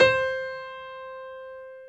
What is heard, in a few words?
MF PF C4, G.PIANO; AKAI S-Series CD-ROM Sound Library VOL-7; G.PIANO 1